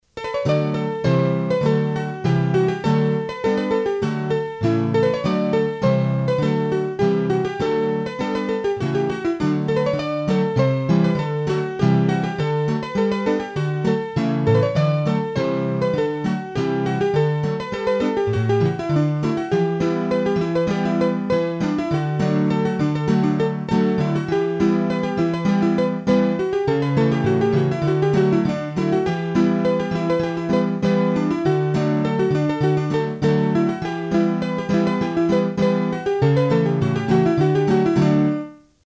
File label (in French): Bourree, hurdy gurdy; Bourrees a 2 Temp